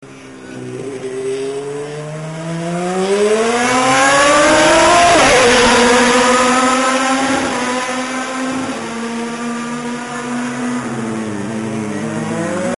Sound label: bike noise, ducati